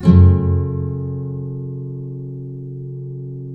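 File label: nylon up minor G, guitars, flam chords; Spectrasonics; Hans Zimmer Guitars VOL-2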